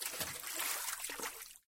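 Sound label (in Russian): ласковый всплеск волн спокойного моря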